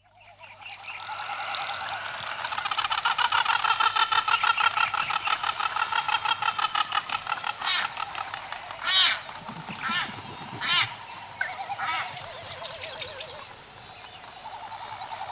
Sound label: frigatebird